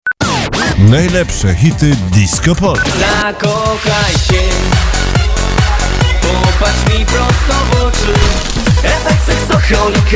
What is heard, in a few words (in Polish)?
zajawka disco polo